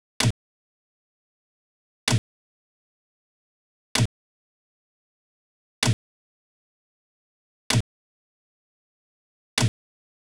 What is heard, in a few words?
tom, Key D#, Bpm 128, Big Room House; Loop Nerds; Big Room House Allstarz